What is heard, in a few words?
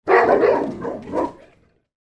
animals dogs st bernards x2 barking; sound effects one